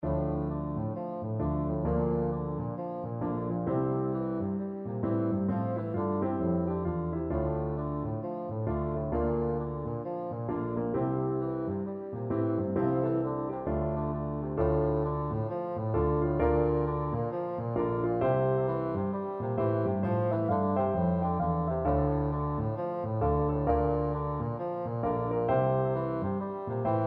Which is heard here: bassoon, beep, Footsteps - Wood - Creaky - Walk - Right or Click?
bassoon